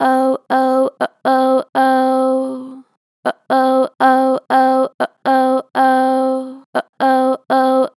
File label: Str Vib Oh Oh, Vocals, Natural Vibrato Strong; Harmor Vocals